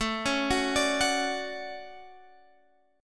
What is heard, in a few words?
D Major 7th, chord